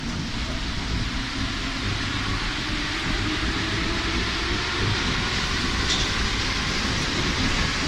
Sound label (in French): acceleration, Metro